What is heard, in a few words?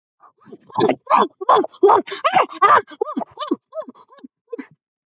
BARKING; ISHOW SPEED; IN OHIO